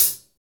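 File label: hi hat; Best Service Gigapack 2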